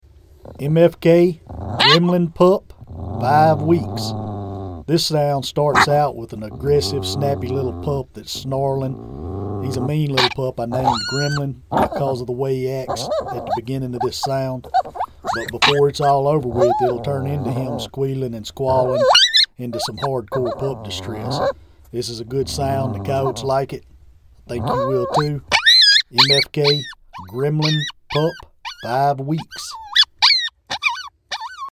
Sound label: Pup 5wks, MFK Game Calls; Grimlin